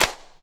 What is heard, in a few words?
Clap n Snap, Snares